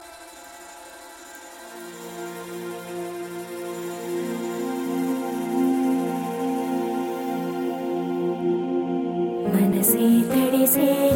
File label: Kick